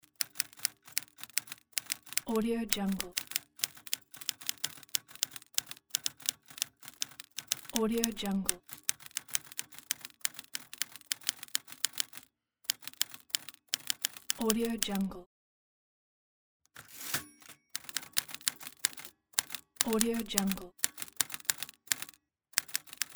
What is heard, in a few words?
typing typewriter loop